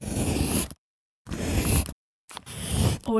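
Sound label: handwriting wax crayon; soundbits-soundfx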